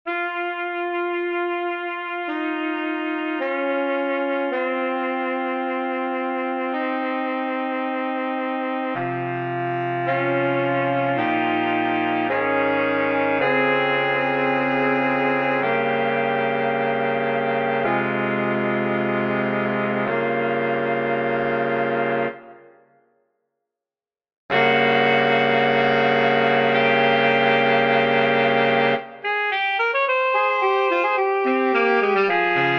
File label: Cantabili MIDI